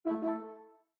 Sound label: Landscape Balloon; windows 7